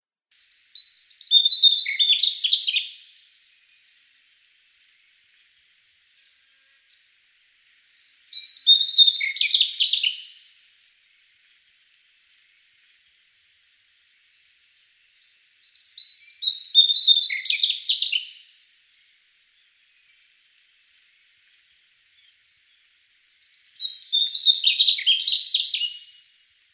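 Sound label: taiga flycatcher; Muholovka taezhnaja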